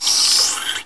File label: Larva